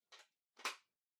Power Strip Far Hand Gentle Plug In

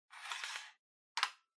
Portable Radio Antenna Shorten

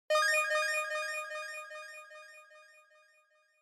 Multi Accent High Delay

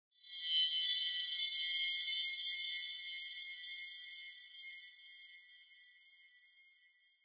Horror Accent, High Long

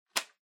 Power Strip Adaptor Hand Gentle Unplug

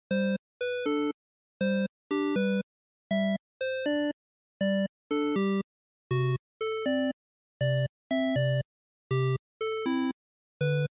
NES General Stage

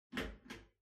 Ceiling Light (Far Pull)

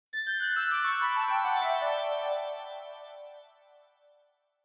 Scene Change High Down Slow

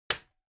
Light Switch Rocker, Dry Hard

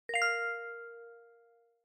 Smartphone UI Sound Dry

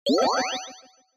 Accent

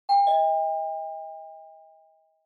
Doorbell Ding Dong

